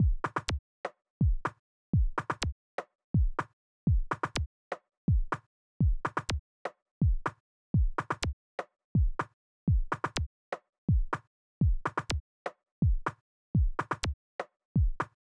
simple drums

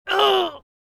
male grunt punch; Butterfinger Video Sound Effects

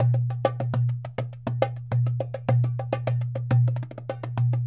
Dumbek

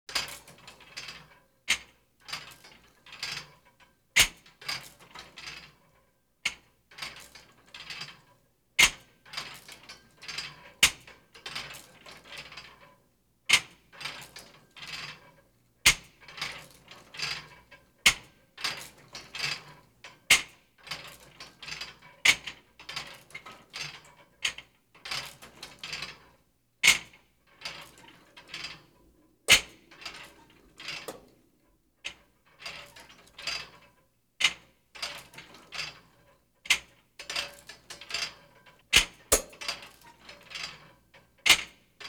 physical fitness; Soccer; Butterfinger Video Sound Effects; Soccer Running Chainlink and Huh

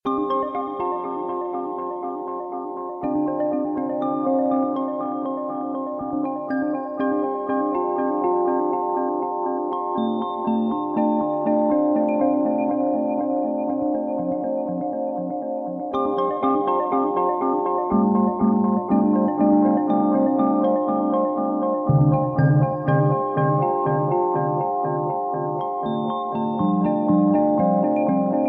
drone; flOw

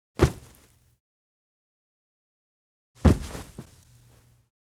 body falls onto bed; fights bodyfalls; Asian Show Sound Effects

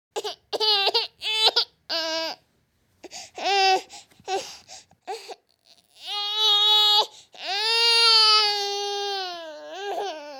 baby whines cries; Asian Show Sound Effects

